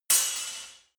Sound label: sword hit sword single